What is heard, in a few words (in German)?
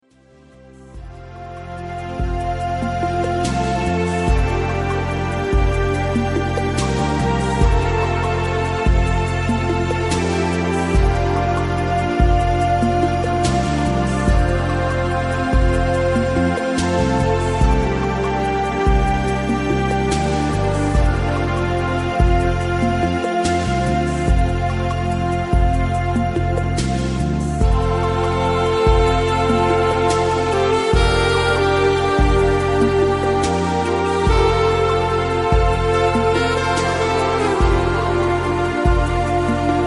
Galaxis Entspannungsmusik